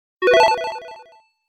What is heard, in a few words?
click to start